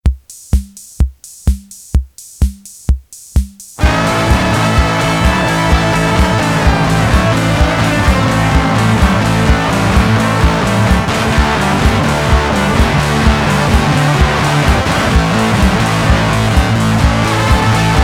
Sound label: rock'n'roll